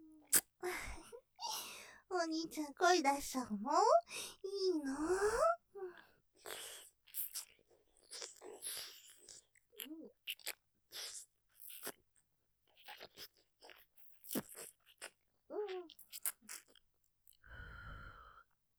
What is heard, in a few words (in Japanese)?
舐め 吸い付くように・吐息多めに, ASMR; ステレオ; ループで楽しむ！シェフの気まぐれ抜き抜きコース